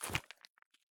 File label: jump gravel; Escape from Tarkov